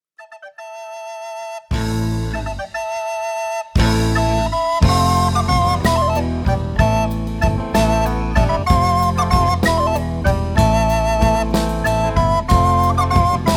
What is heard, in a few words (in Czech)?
fanfara